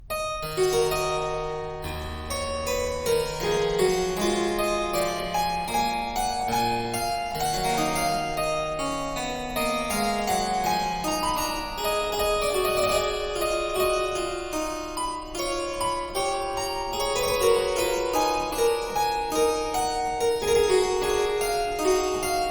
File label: harpsichord